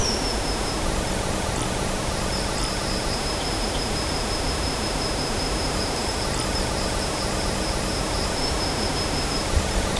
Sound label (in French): hiver en foret sans oisea, snow